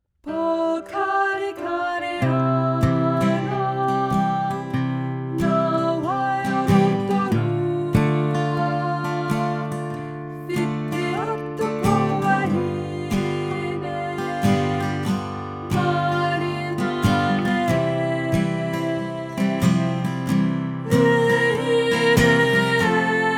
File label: pokarekareana (soprano)